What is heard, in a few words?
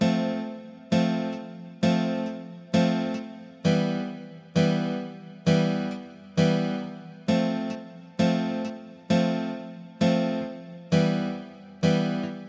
guitar